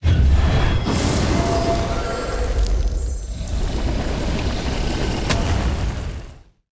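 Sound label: boss earthwind spawn; spiritking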